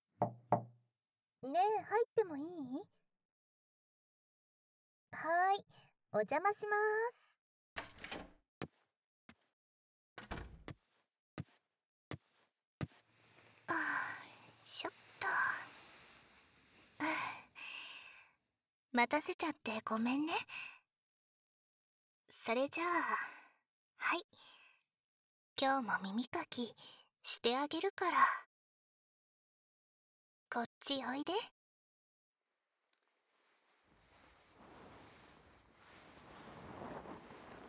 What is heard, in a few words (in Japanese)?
癒しの耳かき; BSS耳かき